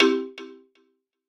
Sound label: PUZZLE Success Xylophone Stab Delay; stereo; Universal Sound FX